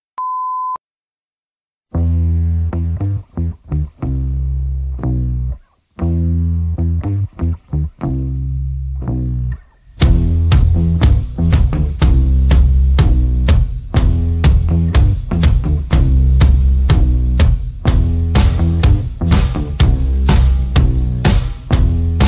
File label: floor music; bridget sloan